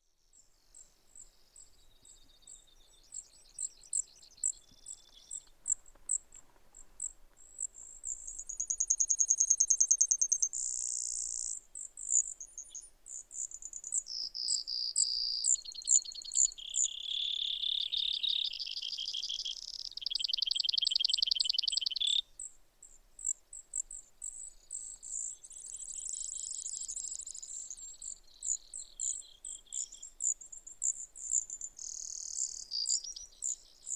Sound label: Brewer's Sparrow